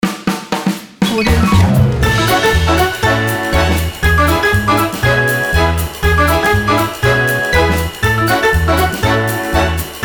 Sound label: happy swing